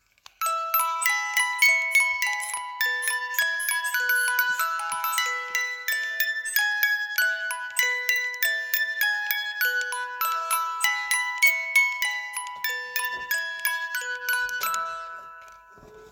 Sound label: Alphabet song, Boites à musique Manivelles